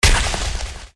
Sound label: curved hurt